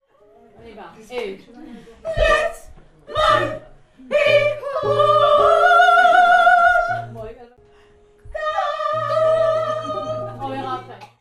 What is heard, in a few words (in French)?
fin soprano; gospel